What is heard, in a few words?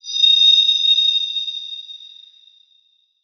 CARRIER cell Idle